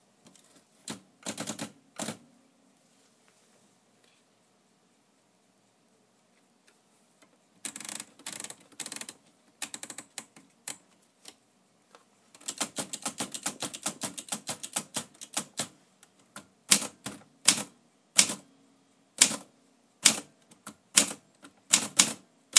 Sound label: typing